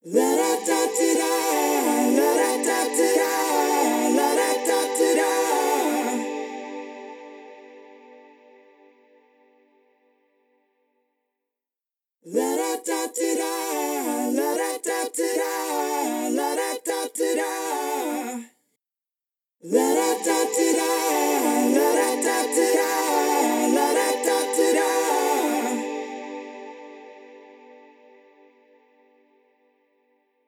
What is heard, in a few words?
Male Vocal Bus; Whispy Ranger; Temperance Pro